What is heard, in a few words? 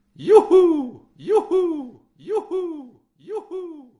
echo